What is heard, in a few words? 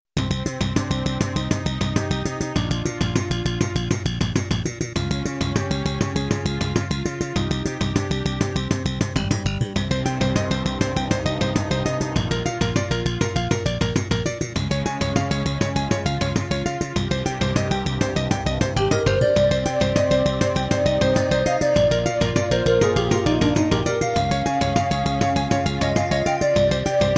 Introduction, Roland Edirol SD-20 GS MODE